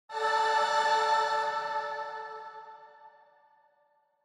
Angel Low Short

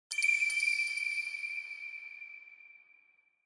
Single Accent High

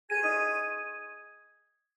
OS Sound (Speaker-Notification)

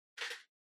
Portable MD Far Pick Up

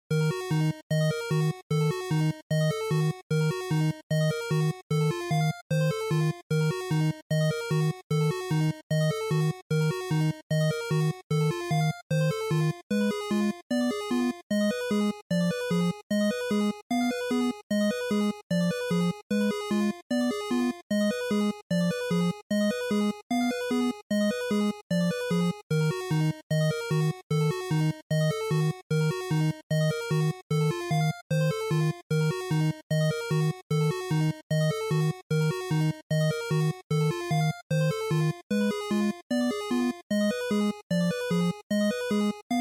NES RPG Battle2

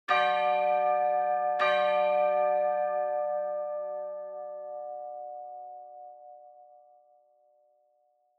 Church Bell High Mid

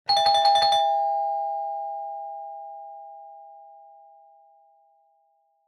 Doorbell Mech Tone, Close

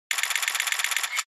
Camera Phone

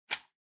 Wall Outlet Regular Plug Unplug

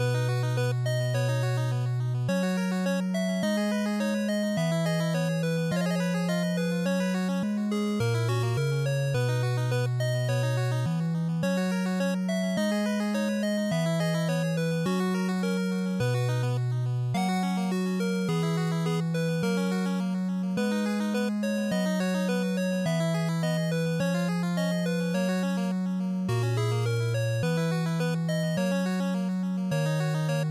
RPG Ending Loop, bgm

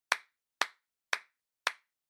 Applause Stable Short Slow

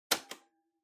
Portable Stereo Tape Stop

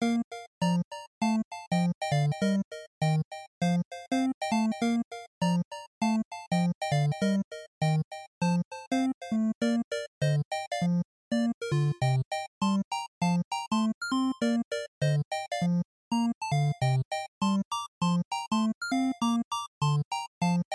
NES RPG Town Loop100

